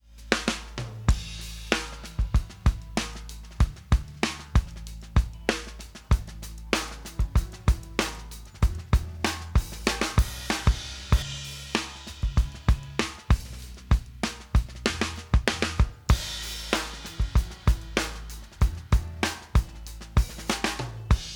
drums add OH tone change, Listen how the snare sounds different when OH mics are on; Mixing for God audio examples